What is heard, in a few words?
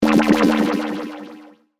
Ray Beam Burst, Scifi; Science, Fiction; Battle Sound Effects; BlastWave